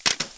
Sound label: clip out; svencoop; hunger; thweapons